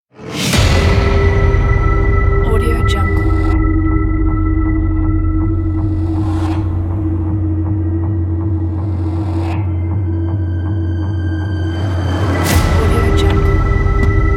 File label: horror trailer